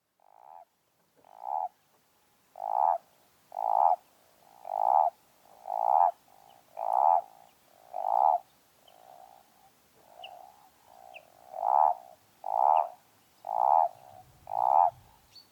common toad bufo bufo; lyagushek i zhab

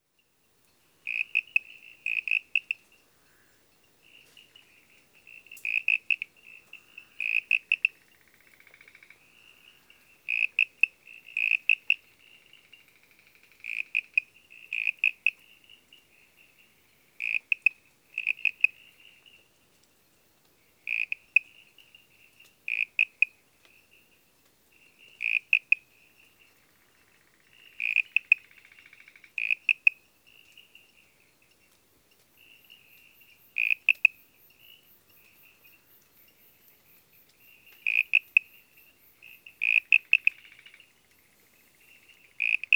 fritziana fissilis, zvuki lyagushek i zhab

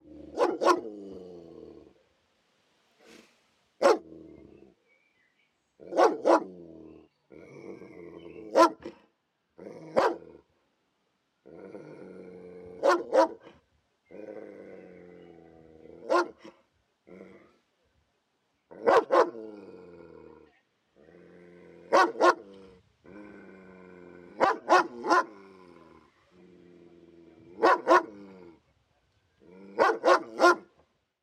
dog bark and growl

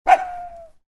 dog hit

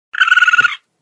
sound of a possums voice in nature; zvuki zhivotnyh dlya malenkih detey

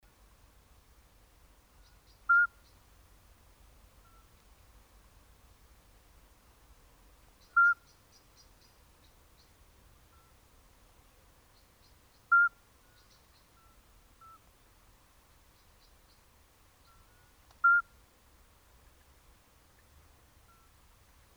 Iberian midwife toad Alytes cisternasii; lyagushek i zhab

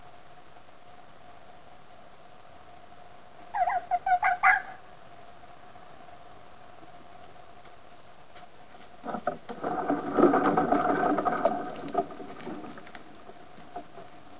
red fox vulpes vulpes; lisy

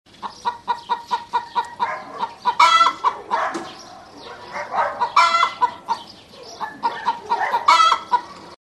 clucking hen in countryside

